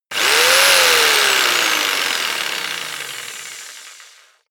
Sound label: jigsaw engine fast medium length; maschinen; saege; soundpool